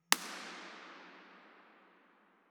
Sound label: IR sweep; Frazier; IMP-RESP